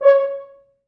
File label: 4 FrHorns; East West; Quantum Leap Horns Sax; 4 FrHorns MOD